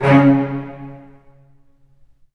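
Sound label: Cello; E-Mu Proteus Pack; Digital Sound Factory Virtuoso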